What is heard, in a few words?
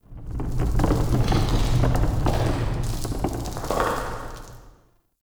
Cave Mine Rumble; Ambience; Midrath Island